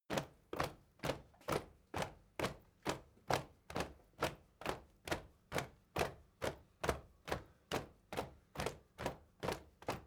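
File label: step crowd march quiet sequence, footsteps; schritte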